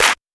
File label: clap one shot hard; Claps